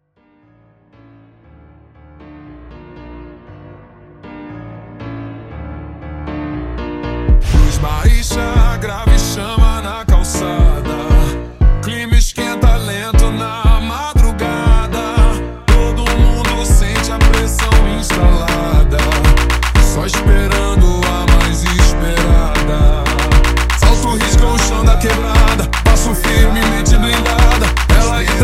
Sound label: super Slowed, funk